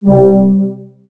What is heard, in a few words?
phoom